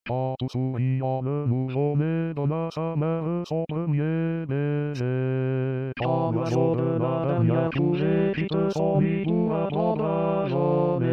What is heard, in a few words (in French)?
Alt chant des saisons